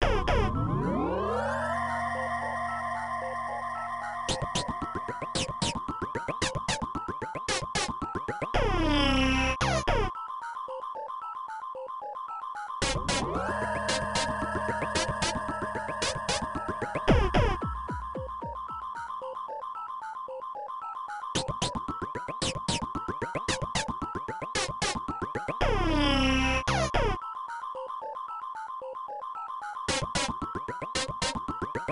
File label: Cosmo Field Ambience; solvalou namco system 21 arcade